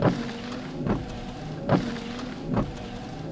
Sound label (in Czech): auto s terace; Mafie 1